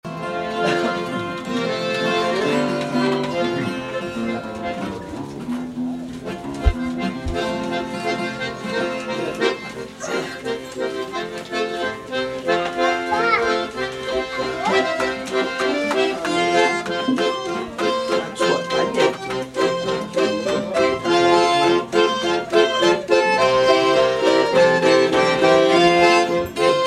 folia de reis instrumental; folia de reis do vale da pedra

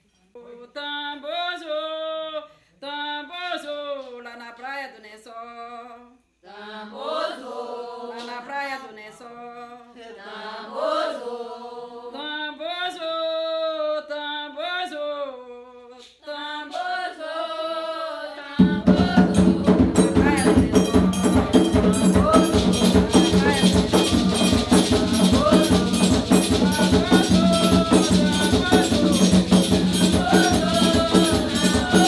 tambor; tenda sao jose

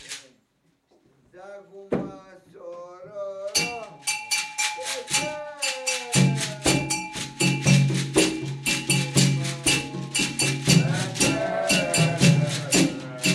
tambor de mina; thoya jarina

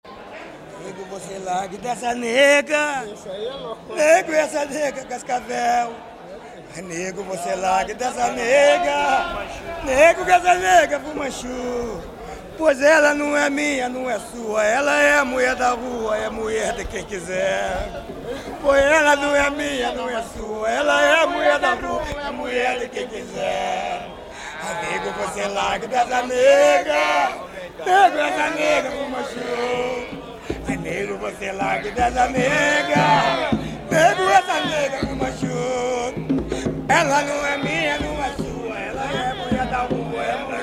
batuque de umbigada; tiete; nego voce largue

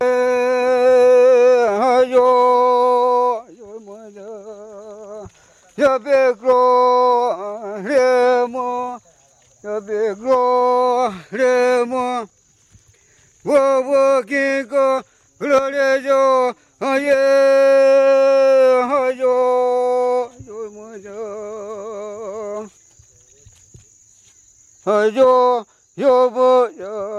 timbiras

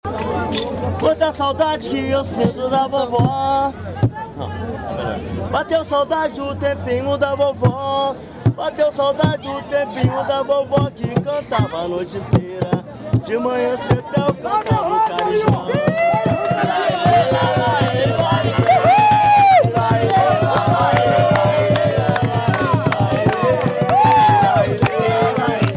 jongo de guaratingueta; bateu saudade

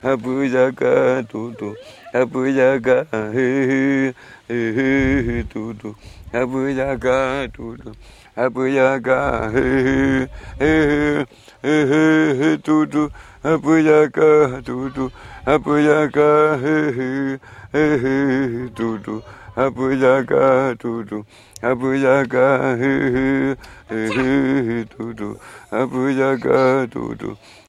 timbiras

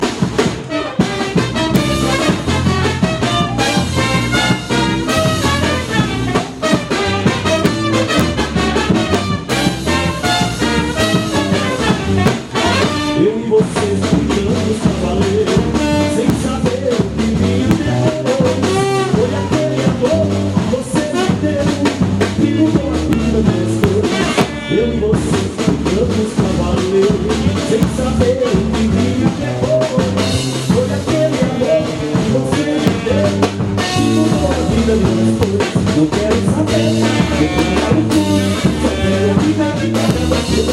carnaval

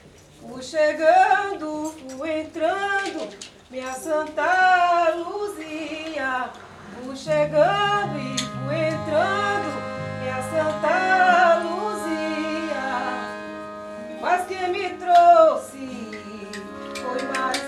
fanti baiao de princesas fui chegando; Baião de Princesas é um ritual especialíssimo e único que acontece apenas uma vez ao ano; casa fanti ashanti

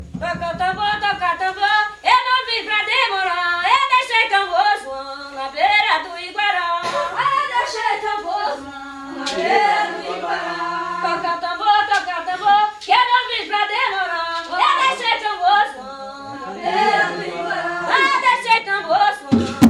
toca tambor; tenda sao jose

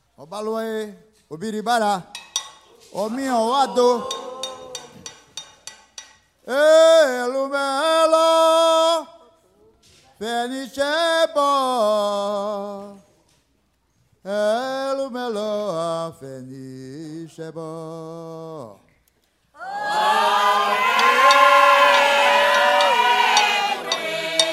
toada para obaluaie

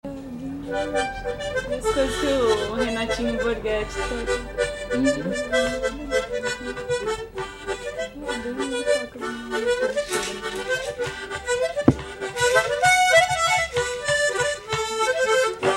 gaita

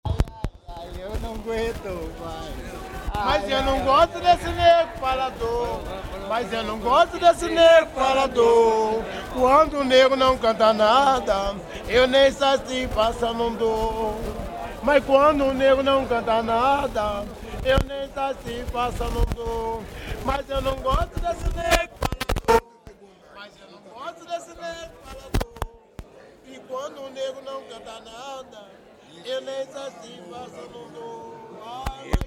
batuque de umbigada tiete nao gosto

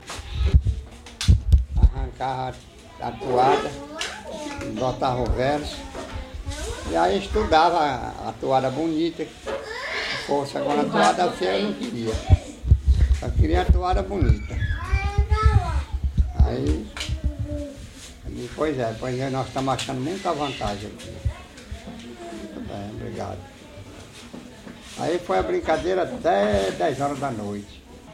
toadas

